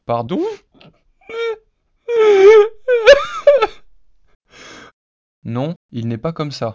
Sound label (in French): rire synthese contextuelle